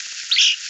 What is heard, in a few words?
weeoo extract, Empidonax traillii; Passeriformes; Tyrannidae